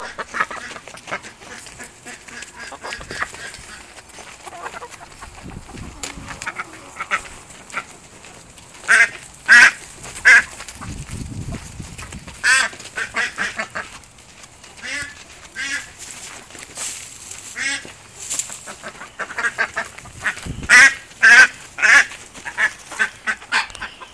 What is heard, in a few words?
celebrate chase ducks